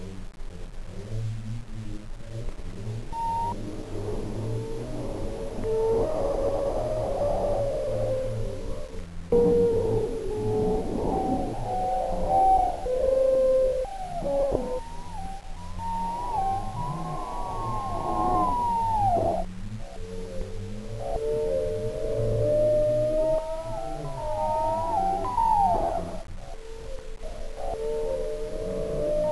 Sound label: alien; svencoop; AoMClassic